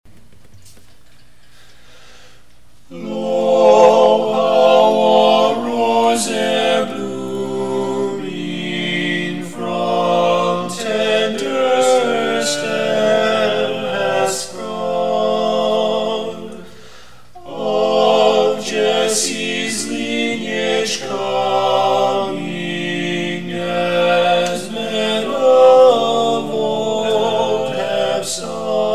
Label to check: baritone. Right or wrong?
Right